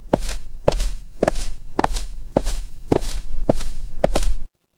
footsteps concrete

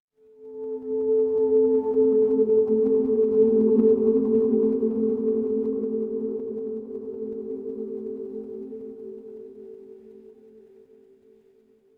horror ambience dark

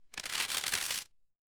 paper fabric crumple

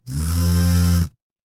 office phone vibrate

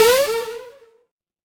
retro game jump

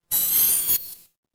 magic scifi hologram

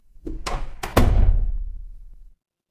door close

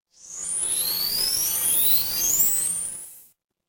transition sweep

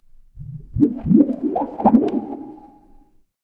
water bubble

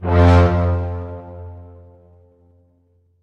transition braam

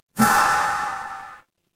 feedback error

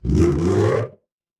cartoon stretch

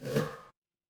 feedback error

